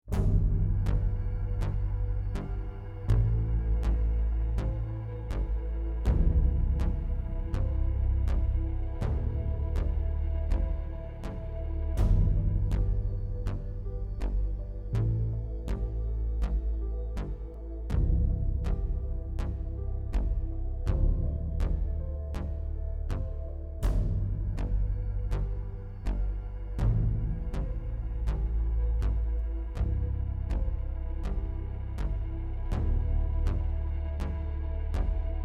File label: Cinematic Tension Atmospheric; amperAI